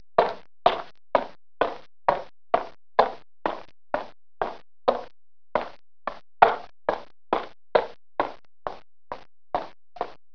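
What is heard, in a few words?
female walk; 8bit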